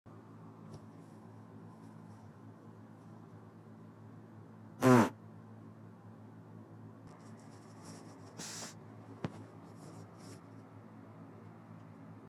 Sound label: morning farts